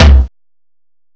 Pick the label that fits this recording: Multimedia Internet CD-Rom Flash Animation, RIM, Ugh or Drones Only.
RIM